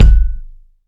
ambient kick one shot g sharp key, Royality free kick drum one shot tuned to the G# note; kick drum samples; one shots